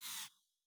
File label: Assault Rifle Zoom Out